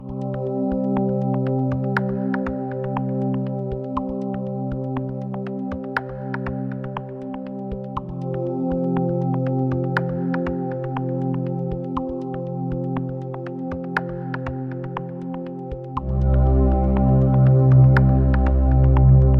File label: Music menu; steamland